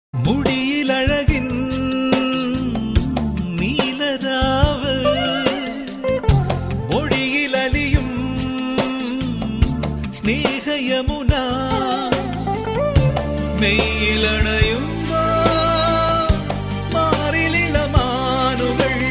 Malayalam; Vellithira

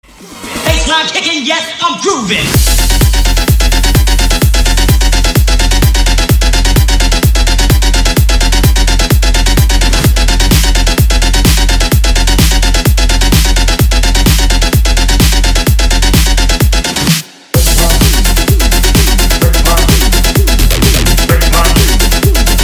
Bassline Kicking

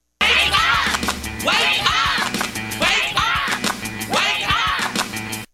Wake Up Cheer

